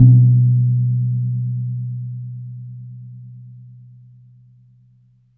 CANANG B2; Spectrasonics; Heart of Asia

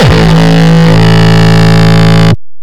Longkick, kicks

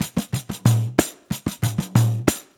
Pandiero, Brushes, Perc Loops; Sonic Foundry (Sony Creative Software); Drum Tools

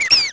"Ahhhh!"; SNES; Super Mario Excitebike

